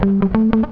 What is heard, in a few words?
FUNKY, Grooves